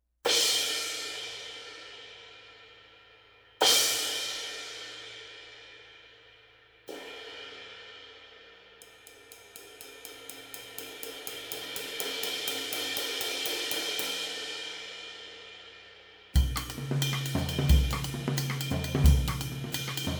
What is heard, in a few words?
20 K Con MTH, Zildjian